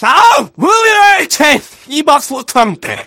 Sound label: laugh long; voice packs; scout